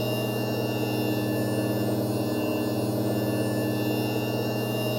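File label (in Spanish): PLATAFORMA MOVIMIENTO, platform movement; yesterday origins gamerip 2016 switch ps4 pc ios macos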